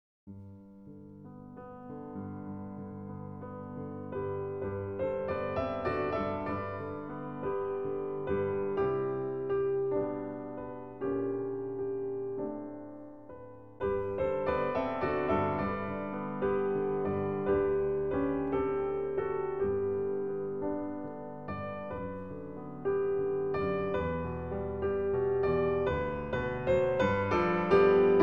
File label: Norwegian folk songs cow call